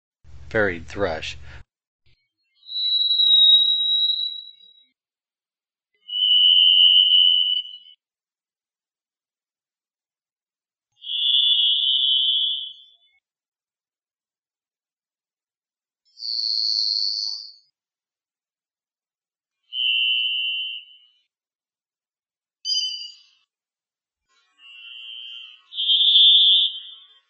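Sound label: varied thrush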